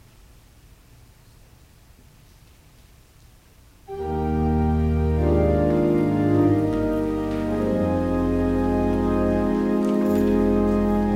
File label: Organ Music; Netherlands Reformed Congregation, St. Catharines, ON